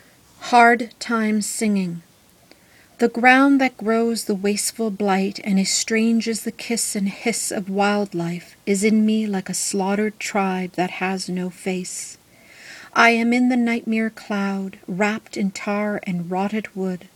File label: hard time singing